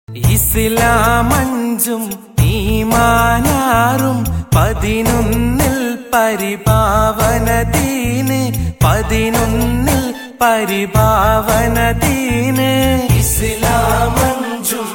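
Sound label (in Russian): islamskij rington, Islamic ringtone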